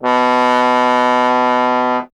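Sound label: bass trombone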